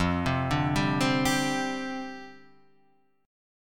G Suspended 4th F; Gsus4/F arpeggiated; JGuitar

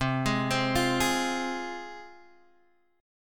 C Suspended 4th Sharp 5th C, Guitar Open G

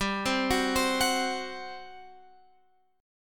G Major 7th Suspended 4th, Guitar, Open F; GM7sus4 arpeggiated

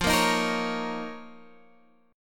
F 7th Suspended 4th Sharp 5th; F7sus4#5; x,8,8,8,11,9; strummed

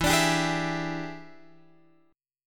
E 7th Sharp 9th Guitar Open F, chord; E7#9; strummed

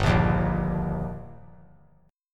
G Minor Sharp 5th G, chord; strummed; JGuitar

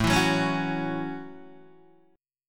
A 6th, Guitar, DADGAD

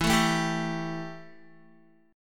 E Minor Double Flat 5th, Guitar; 11,12,9,12,9,x; 4F,4A,5C,5G,5C,6E; strummed; JGuitar

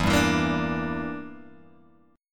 E Minor 7th Flat 5th E, Guitar Open G

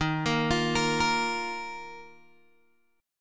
D sharp Suspended 2nd, Guitar, Open G

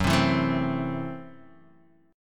F sharp Minor 7th Flat 5th, Guitar Half Step Down

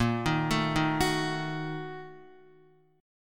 A sharp Major 7th Suspended 4th Sharp 5th, Guitar; arpeggiated; 4F,4A,5C,5G,5C,6E; JGuitar

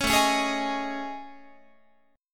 A Major 7th Flat 5th, down Guitar; AM7b5; strummed; 16,17,16,18,19,19

